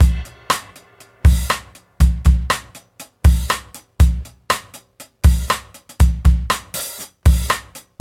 120 bpm drum loop c key, Free drum beat - kick tuned to the C note. Loudest frequency: 1094Hz